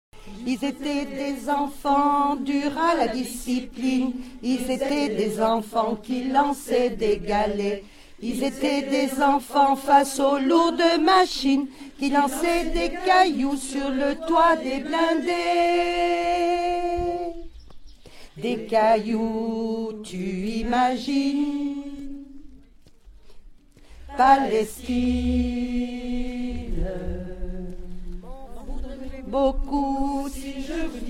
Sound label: Alto